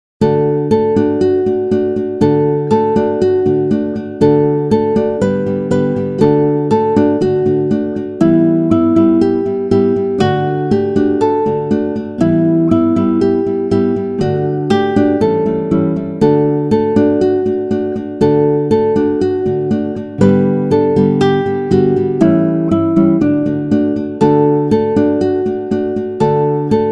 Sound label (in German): Blaetterfall git